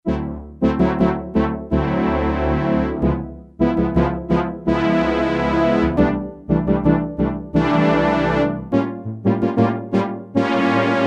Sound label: Ensemble, Yamaha TX802; Performances; Factory Patches